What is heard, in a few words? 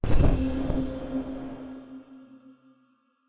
bardic Inspiration layer key Of C; class features